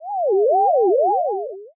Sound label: ghost voice